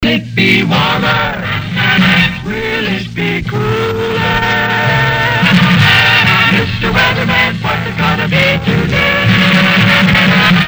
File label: jingles will it be warmer; crc series 3